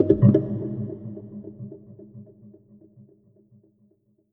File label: Tribal Omni